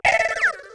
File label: laser trail